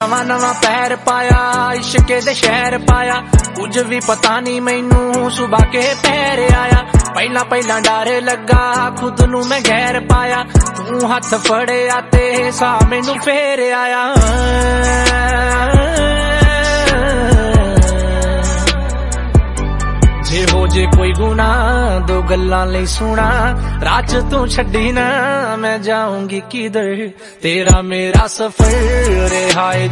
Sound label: Punjabi Ringtone